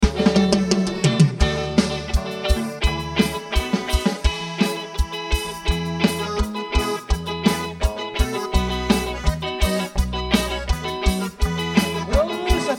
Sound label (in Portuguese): xote